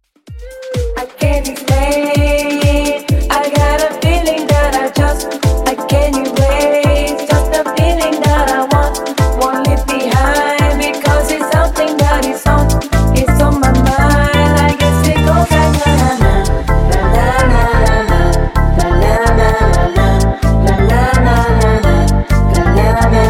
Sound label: Mash Up Clean 128 Bpm